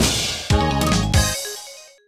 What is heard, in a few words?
Jingle, Fanfare; english training have fun improving your skills ds gamerip 2006